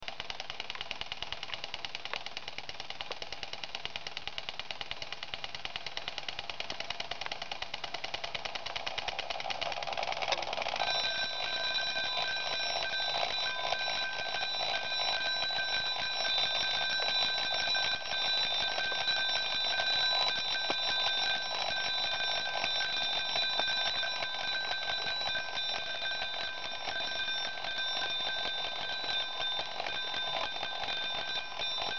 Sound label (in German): Kienzle DUO Sound